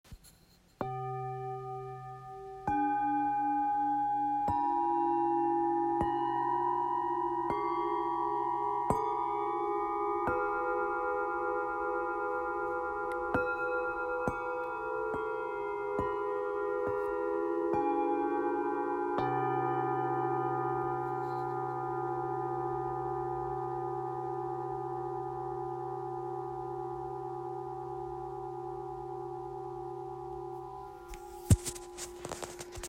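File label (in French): Set, bols chantants tibétains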